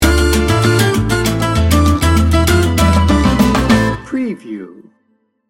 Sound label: chica dancer sting bumper